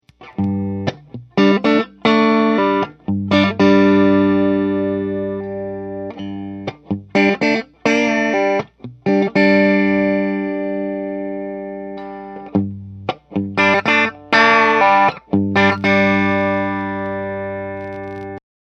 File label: vintage tele 3 way